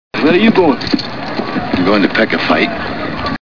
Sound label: pick fght